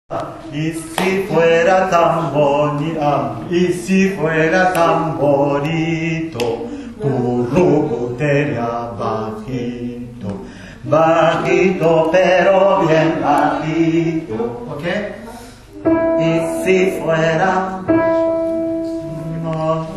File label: BULLERENGUE